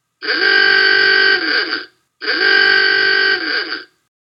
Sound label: Oogah